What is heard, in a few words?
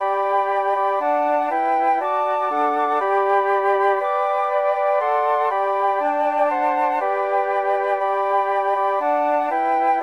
for flute quartet; Louis-Claude Daquin